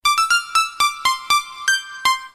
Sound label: Telecom Sms; Dialog